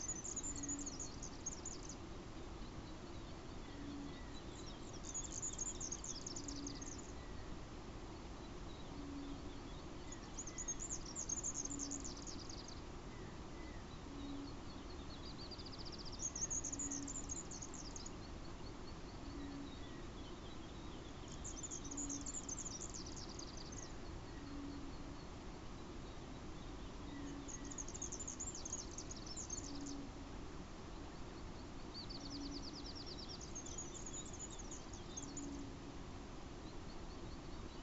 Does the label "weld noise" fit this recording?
Yes